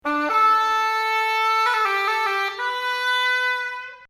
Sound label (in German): schalmei